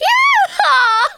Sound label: Emote Cheer; Twitch Skijump